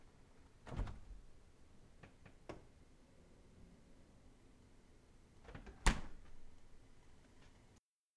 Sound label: Fridge Open Close